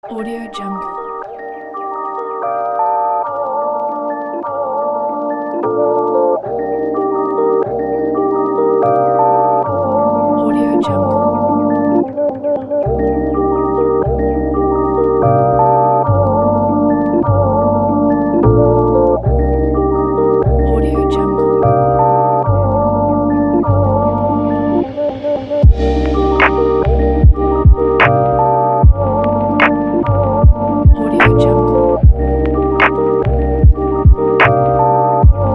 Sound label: travel vlog timelapse opener